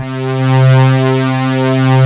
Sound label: OBX SYNTHS; Pro-Rec - Synthsonic; JV ANALOG1